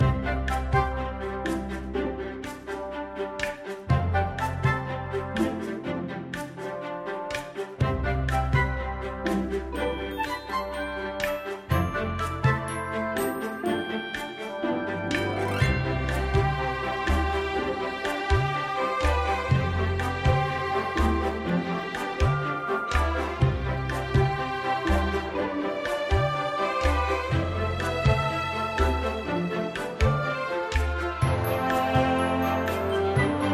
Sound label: Ingame Loop; Scrubby Dubby Saga; Android